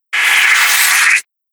Slicing Suction Whoosh; Swish, Sweep And Swoop

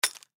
Candy Dish Mint Drop; Food; Household; foley; Blastwave

